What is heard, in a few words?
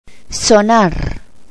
telephone ring